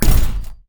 water gun

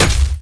flail

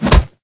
boxing

